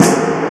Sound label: SNR; AMG - Now CD-ROM (Roland)